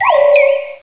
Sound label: cry; source of the sovereign